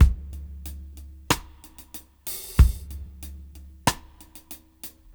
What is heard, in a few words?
Half Time Slow 94 BPM; AMG - Keith LeBlanc Phat Butt Jams